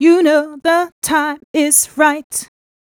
f mel d, fem Vocals

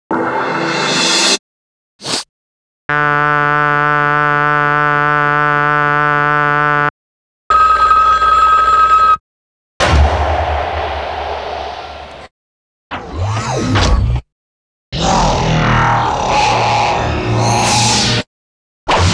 breaks, Jungle; Zero-G Jungle Frenzy 2

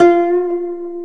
KOTO F2 BEND, ETHNIC; AKAI S-Series CD-ROM Sound Library VOL-8

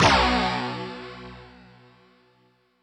U220 FX Set, Drum Machines; Roland U220

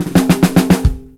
104 bpm, Breaks; POTBreaks

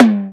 HIGH TOM; Greytsounds; Scott Peers Sound Engineering

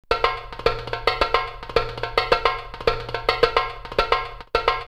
Tripoli 108, drumloops World & Ethnic; X-Static Goldmine

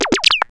Odd, Synth FX, cartoon caper; musicradar

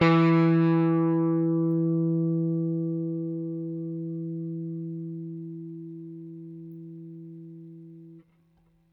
Rickenbacker Bass; Airwindows

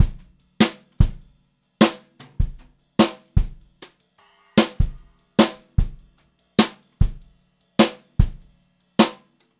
Brushed Drum Loops, JAZZY; USB Soundscan vol.39; AKAI; 90 s Sample CDs

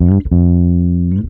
HEY, Roots Disco; USB Soundscan vol.32; AKAI

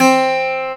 CG HARD C3 L, Celtic Flavours; USB Soundscan vol.42; AKAI

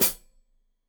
Hat Foot, Hi-Hat, Cymbals Stereo

